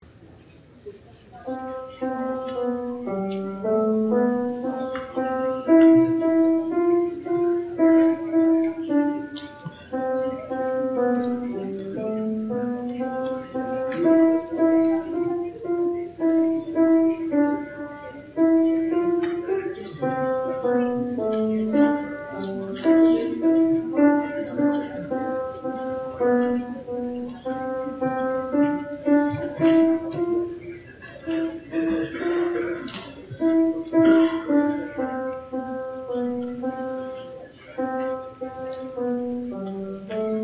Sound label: preservice, instrumentalists